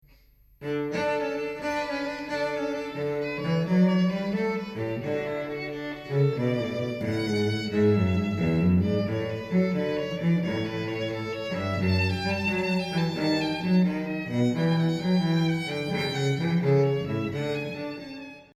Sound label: bach gigue violin cello; Bach: Gigue from Suite # 3 in D Major